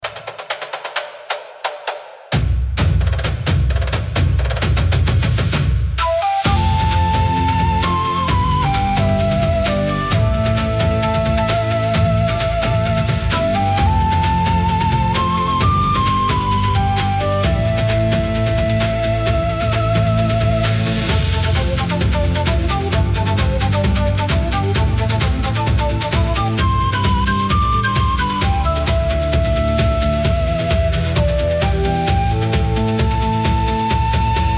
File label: Background music